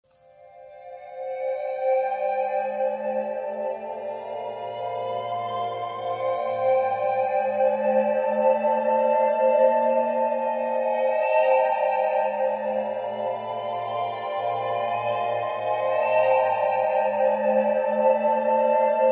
Silence relaxing meditation cinematic background music